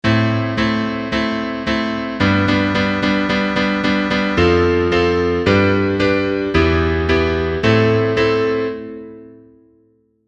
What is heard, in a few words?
diatonic